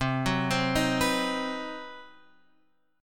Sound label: C Minor Major 7th Flat 5th chord, Guitar Open F; arpeggiated; JGuitar